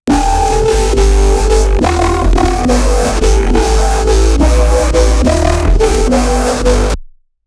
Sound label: squishy but colorful dubs, dubstep